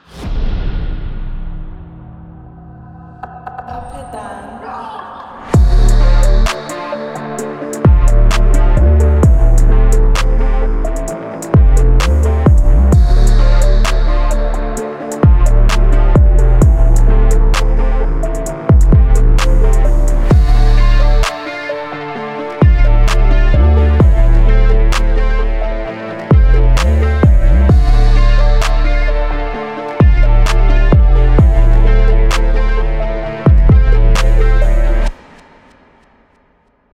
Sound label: BEAT